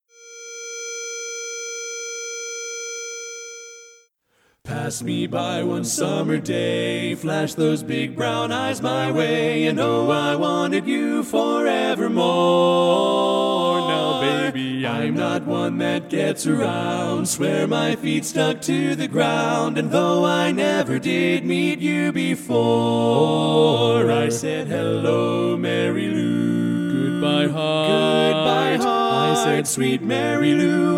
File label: Baritone missing; Polecat II